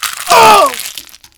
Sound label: splat; gesource; mk blockfort